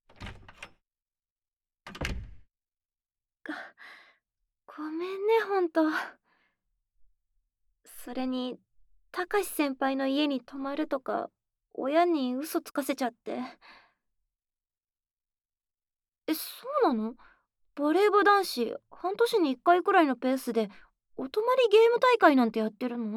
耳かきASMR; 膝枕から伝わるドキドキ

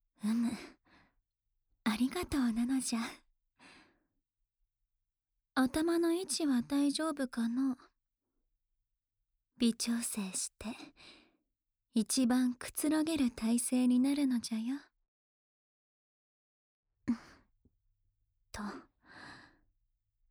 最後までわらわの癒しを堪能するのじゃよ(右＋お耳ふー), ASMR; 仕上げじゃ

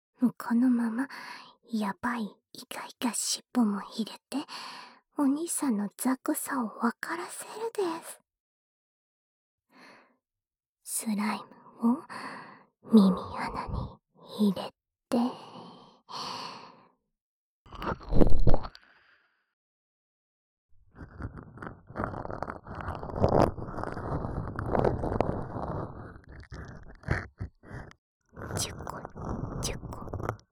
左 スライム耳ブラシ

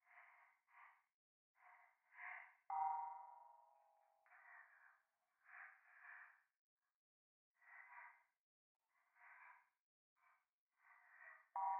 左耳 快感 吐息 寺; 夢の中

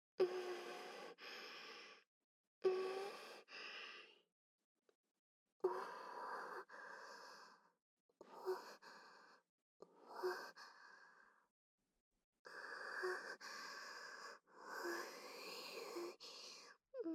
胸舐め喘ぎ, ASMR; アドリブ集

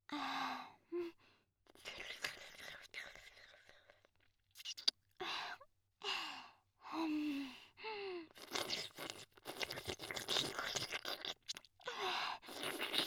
フェラチオ; SEなし

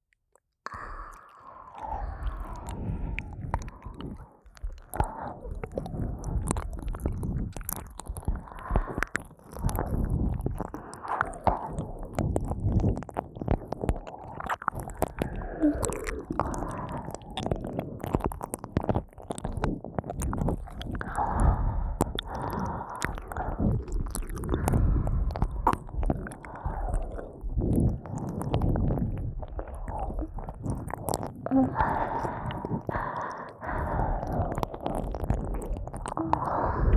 耳舐め 左右反転

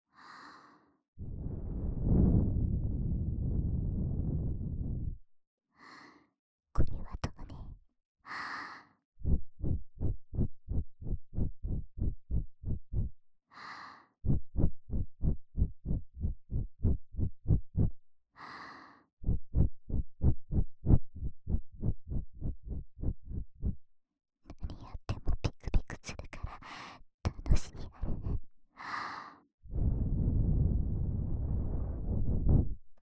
左 耳ふー, ASMR

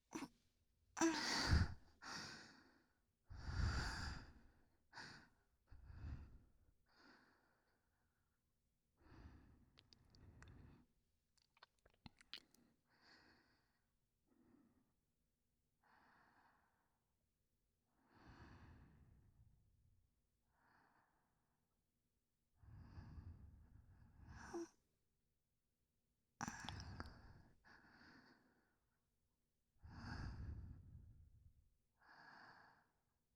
寝息; SEあり

